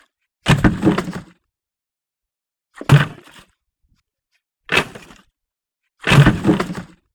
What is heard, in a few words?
Gentle Garbage Smashes